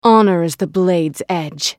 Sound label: attack; Akali